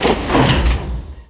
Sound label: door start; movers